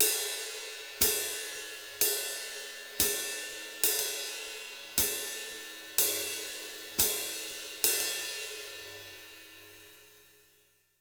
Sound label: RID, Jazzistic, 060; Univers Sons